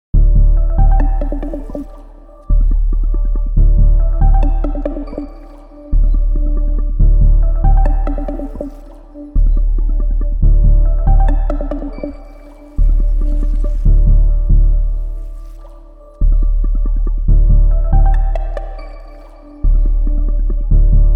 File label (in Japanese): 冷たい洞窟